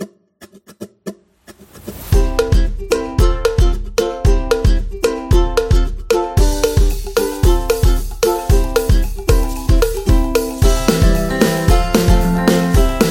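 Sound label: Happy Kids, Kids background music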